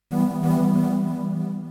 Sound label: wings, 140bpm keyboard; hous-tec